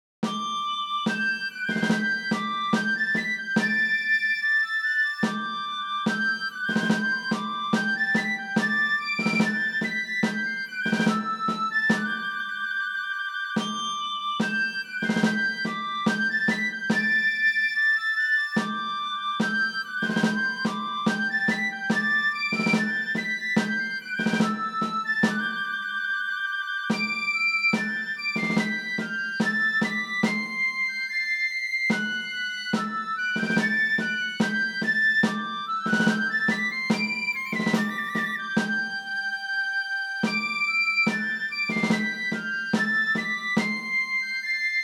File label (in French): marche mousquetaires